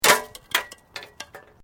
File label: Metal Light Movement Minor